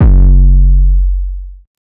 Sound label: 808 one shot F#; Slate Digital BASSHOLE Sample Pack